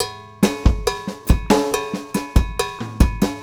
070 Latin Jazz Drums Cowbell; Pro Tools Essential Loops; Digidesign

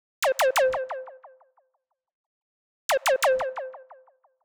HipHop Synth F min; Digidesign; Pro Tools Essential Loops

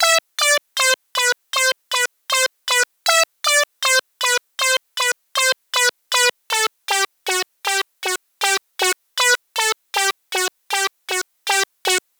HipHop Synth Chopy Amin; Digidesign; Pro Tools Essential Loops

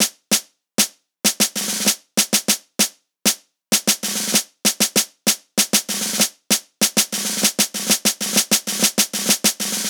Hip Hop Army Snare, Drums; Pro Tools Essential Loops; Digidesign

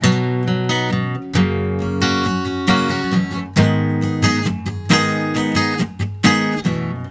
Pop Ac-Guitar Rhythm Emaj; 135; Pop Rock; Acoustic Guitar; Pro Tools Essential Loops; Digidesign

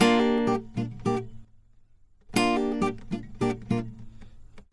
Pop Ac-Guitar Dmin; Pop Rock; Acoustic Guitar; Pro Tools Essential Loops; Digidesign

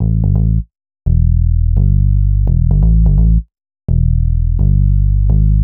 HipHop Synthbass Amaj; Digidesign; Pro Tools Essential Loops

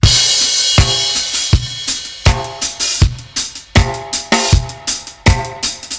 080 Roots Reg Drums; Digidesign; Pro Tools; Pro Tools Essential Demos; Essential Loop Demos; Demo Session - World

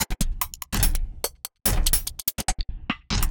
145 Dance Hats Beat; Dance DJ; Drums; Pro Tools Essential Loops; Digidesign

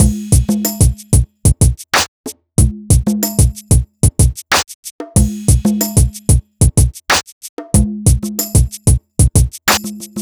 093 West Coast HipHop Drums; Digidesign; Pro Tools; Pro Tools Essential Loops

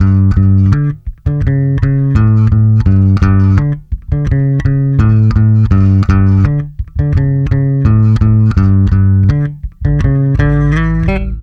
084 Pop E-Bass Gmaj; Pop Rock; Pro Tools Essential Loops; Digidesign

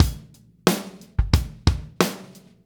090 Rap-Rock Drums; Pop Rock; Moderate Tempo; Pro Tools Essential Loops; Digidesign

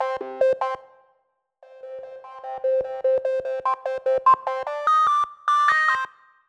R&B Lead Cm; R&B Funk Soul; Digidesign; Pro Tools; Pro Tools Essential Demos; Essential Loop Demos; Demo Session

